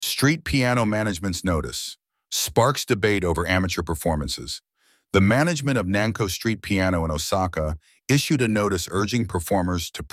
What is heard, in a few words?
piano; reet piano managements notice sparks debate over amateur performances